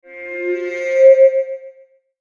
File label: Logo Sweep Pulse; Sonic Logo Sound Effects; Production Element Imaging Element Accent Transition